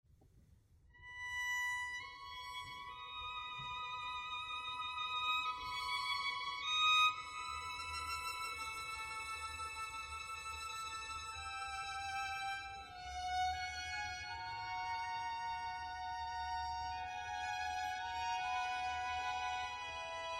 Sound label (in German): Streichquartett; Exaudi. 4. Streichquartett